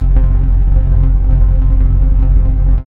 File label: SYN FX; X-Static Goldmine 1